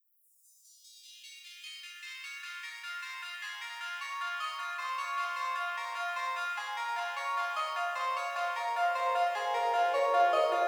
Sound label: tubular tone